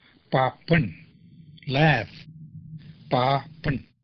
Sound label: laugh